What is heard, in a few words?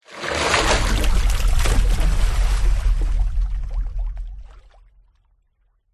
water whoosh impacts movements; george gazetis